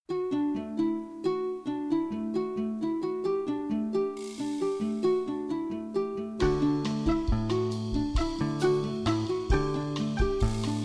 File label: room flute